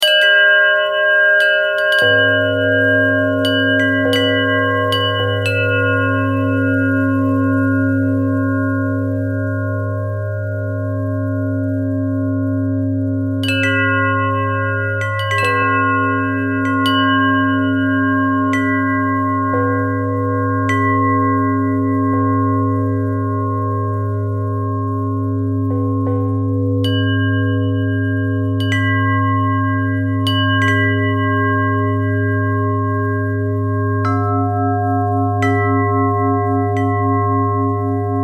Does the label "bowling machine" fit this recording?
No